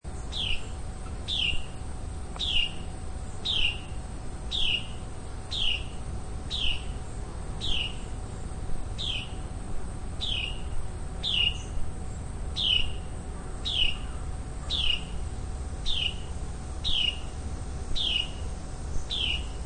General Countryside Ambience, Recorded in rural countryside area; upstate New York on a hot summer day. Buzzing insects